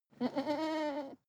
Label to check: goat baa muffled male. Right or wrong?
Right